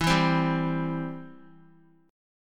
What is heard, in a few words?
E Minor Sharp 5th, Guitar, 4F,4A,5C,5G,5C,6E; x,7,4,5,7,x; Em#5 strummed